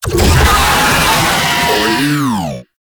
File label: Pain, Vocals; Warframe; Proto Infested; Babau